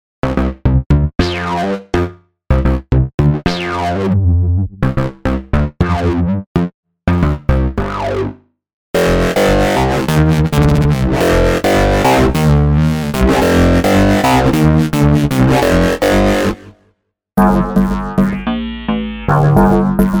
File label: basses